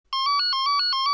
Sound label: Ring